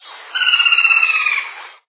barranqueiro de coroa castanha

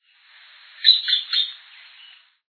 dancarino-de-crista-amarela

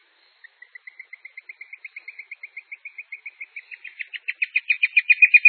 arapacu-de-bico-branco

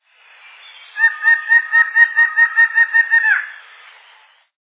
choca de olha vermelho

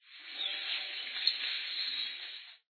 assanhadinho

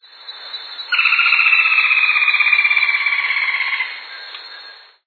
barranqueiro pardo

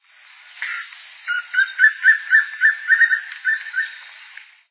papinho-amarelo

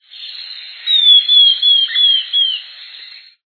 caneleiro

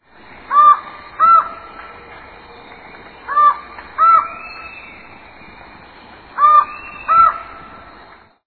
gaviao mateiro

